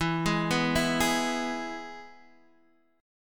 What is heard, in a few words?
E Major guitar chord, Standard; arpeggiated; JGuitar